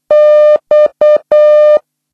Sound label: Morse X